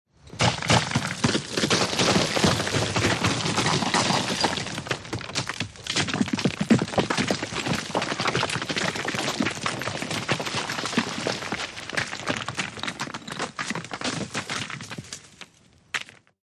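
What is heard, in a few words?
Small Rock Slide Fall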